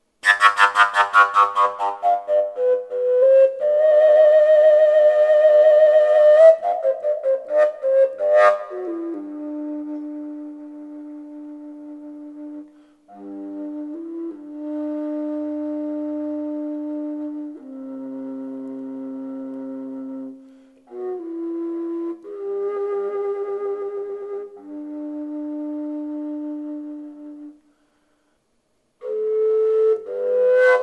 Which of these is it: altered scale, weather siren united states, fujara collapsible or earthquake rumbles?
fujara collapsible